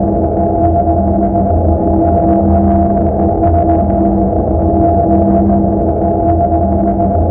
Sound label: train station